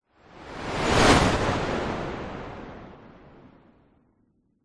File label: sound design whoosh fast whizz powerful; zapsplat